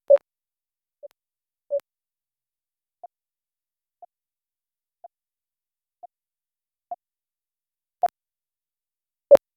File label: ui, button